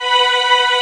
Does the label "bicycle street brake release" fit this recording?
No